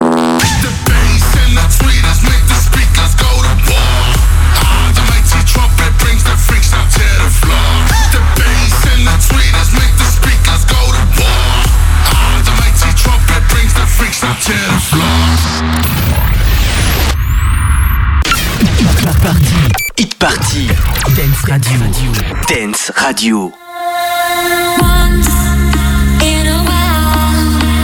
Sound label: jingle; hitparty